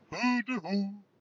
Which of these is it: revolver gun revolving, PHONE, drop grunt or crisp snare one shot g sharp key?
drop grunt